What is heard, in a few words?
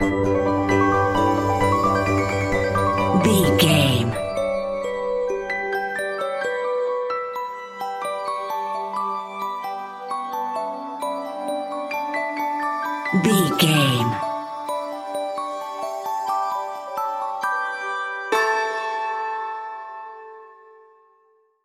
whimsical utopia bumper